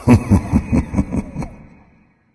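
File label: "heh heh", nonverbal; smite; voicepack; khepri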